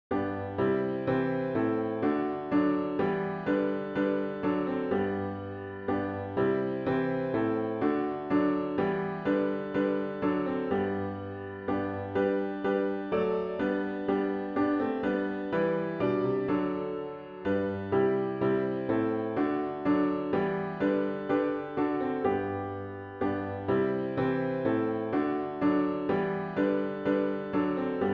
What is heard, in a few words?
piano only; In Christ Called to Baptize